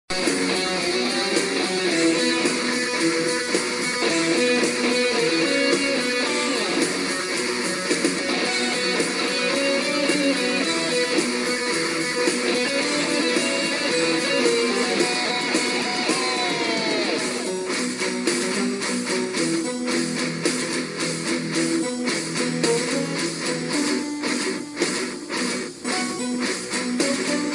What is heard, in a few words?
Metal Ringtone; Sherlock Bbc